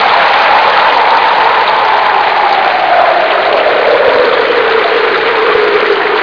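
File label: rain wind storm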